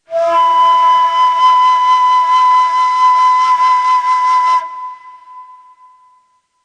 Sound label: Muhayyer, Ney